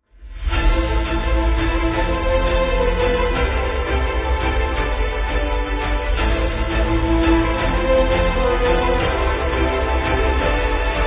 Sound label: Epic Adventure Cinematic Trailer